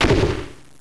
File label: big explosion; Powermanga